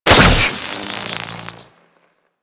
Fire; Warframe; Captain Vor; Nervo

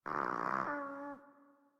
Kitten Snore; Enemies; Warframe; Catbrow

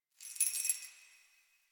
Keys Jingle

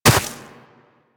Run Snow, Footsteps; Warframe; Operator

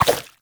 Baby Jump; Warframe; Nokko Colony; Visions

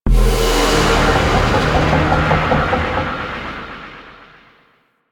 Void Dragon ring correct; Enemies; Warframe; Duviri

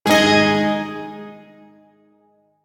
Shawzin Chromatic Chord; Warframe; Duviri Shawzin

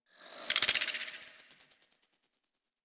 Insect Close; Warframe; Ambience; Eidolon Plains; One Offs

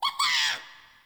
Sprout Vocal Surprised

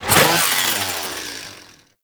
Hand Saw Whoosh

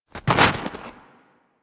Armadillo Move Snow; Warframe; Hunting Critters; Foley

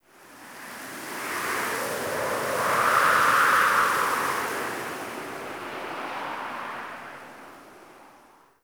Camp Wind Gust D; Quests; The New War; Ambience; Drifter Camp

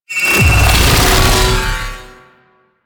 Warp Gate Close; Warframe; Sentient Devourer

